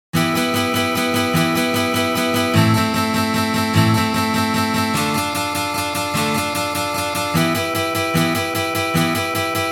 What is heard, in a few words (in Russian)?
CHechenskij boj na gitare